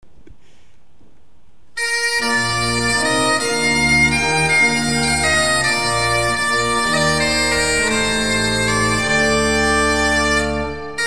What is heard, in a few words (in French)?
Orgues et Bombarde